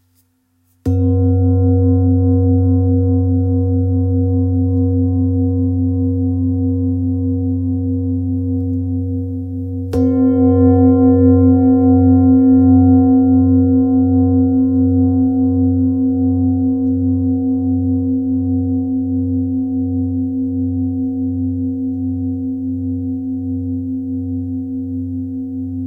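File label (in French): Bol ramgandi do, bols chantants tibétains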